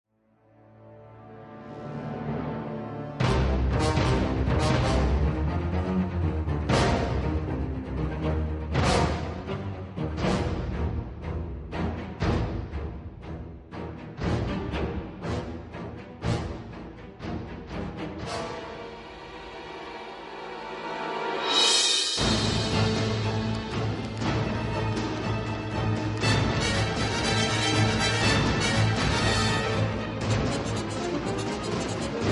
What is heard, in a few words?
loop; avengers